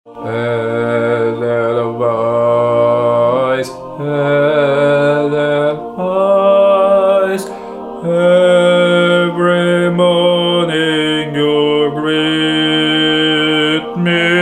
baixo

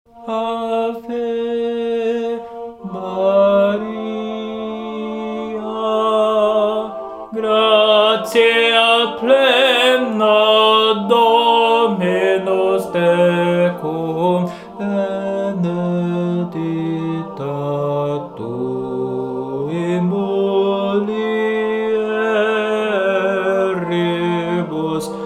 Ave Maria Baixo

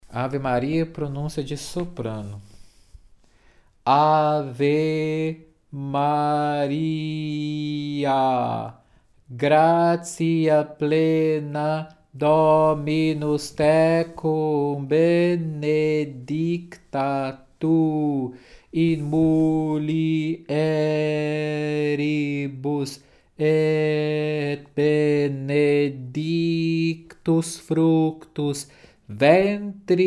Ave Maria Leitura Ritmica Soprano

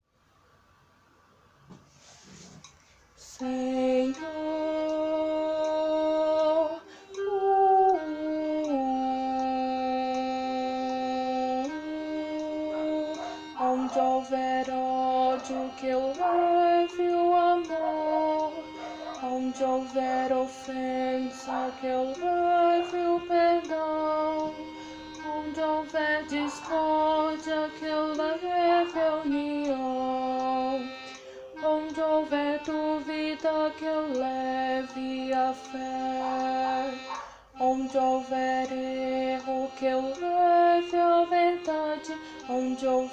oracao a sao francisco contralto

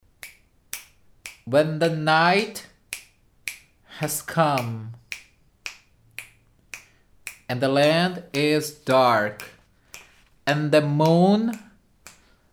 leitura ritmica soprano e tenor

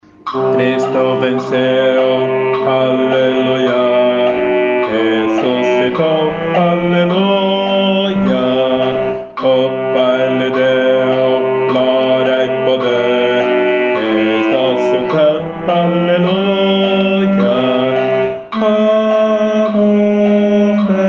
cristo venceu baixo

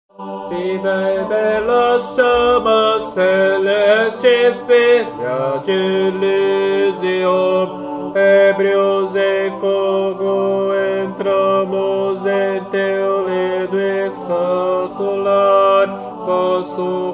ode a alegria tenor; an die freud

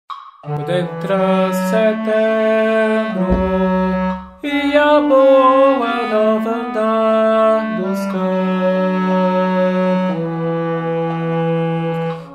tenor

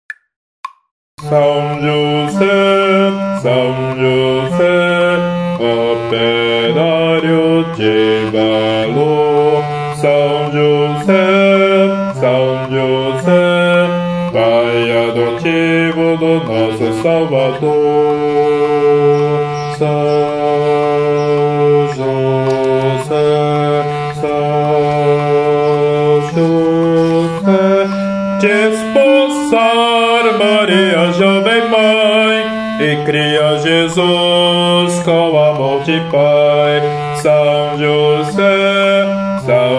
hino a sao jose baixo

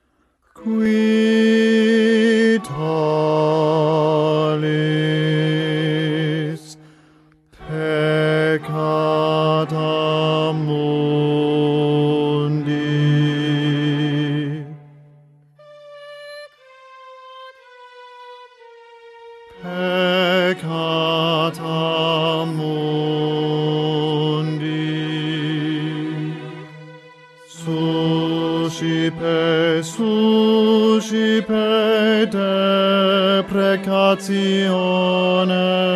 baixo; qui tollis

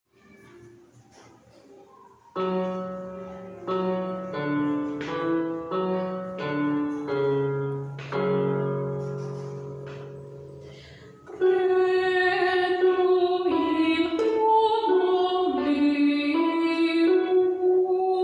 credo contralto missa festiva

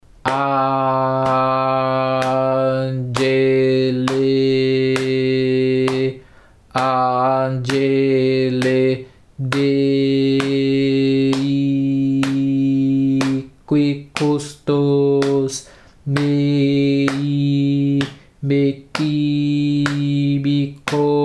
leitura ritmica tenor

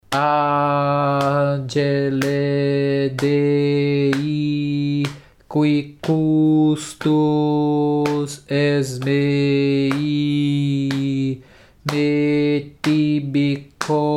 leitura ritmica contralto

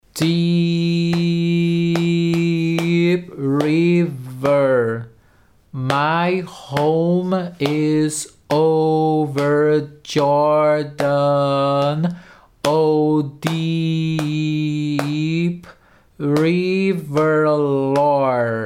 leitura ritmica contralto tenor e baixo; deep river

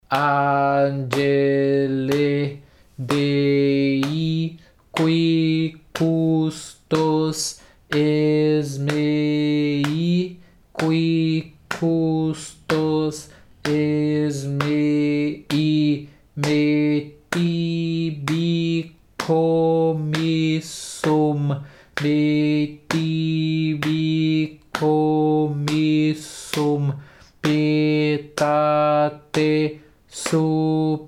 leitura ritmica baixo

